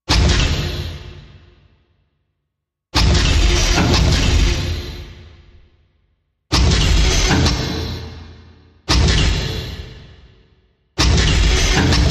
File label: Stress Press Machine, machines winches